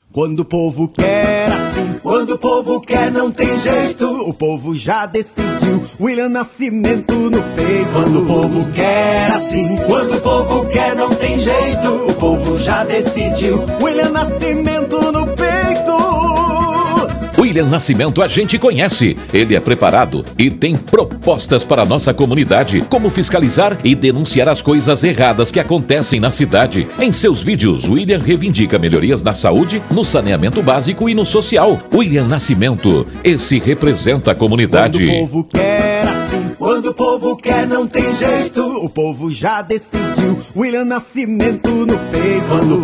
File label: Jingle